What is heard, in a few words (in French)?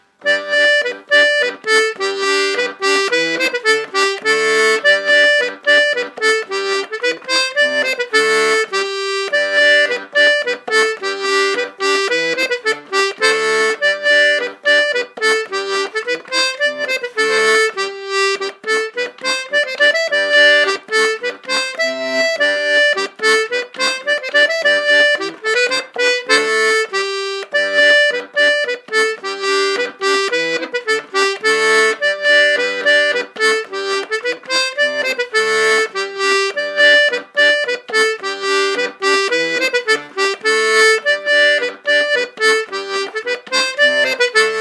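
avant deux de pleherel, accordéon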